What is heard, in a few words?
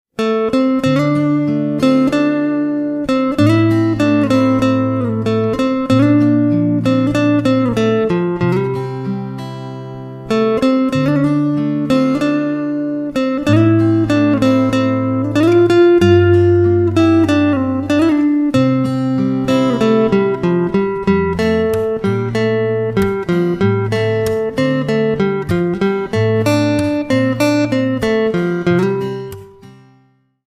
Guitar, instrumental ringtones